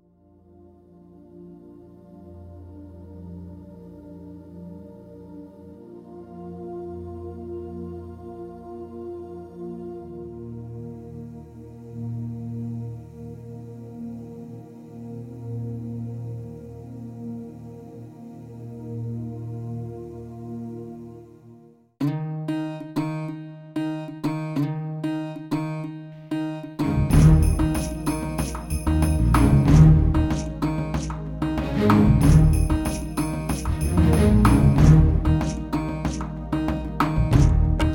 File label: Karaoke sound track of the song, Sindhi